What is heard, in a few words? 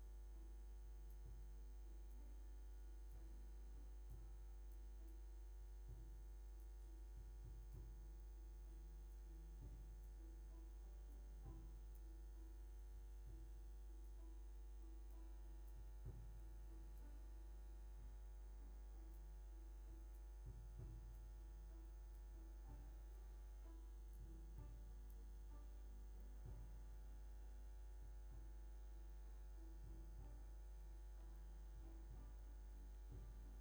Bass DI